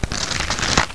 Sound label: hand